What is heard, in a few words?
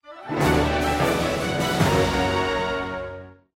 win; battle